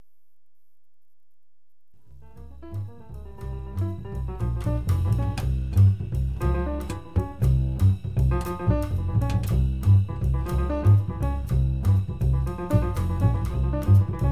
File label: namib piano solo